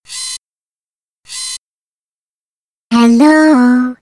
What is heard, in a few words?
power on